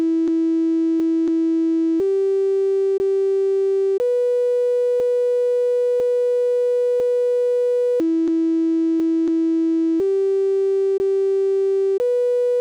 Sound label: sine wave